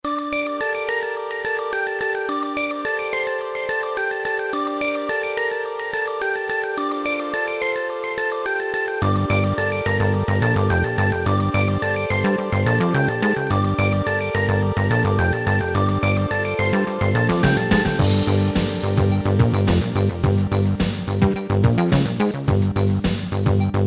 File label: music